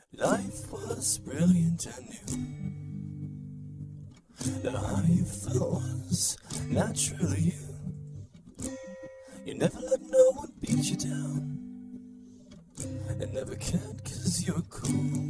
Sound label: percussive